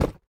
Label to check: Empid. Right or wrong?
Wrong